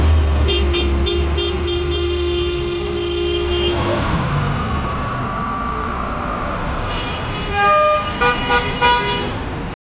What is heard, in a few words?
loud chaotic city traffic